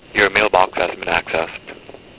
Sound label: pickup; fax